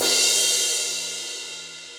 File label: Crash 14 Bright Room, Cymbal; Drums; Drum Hits; Standard Hits; Session Drums Studio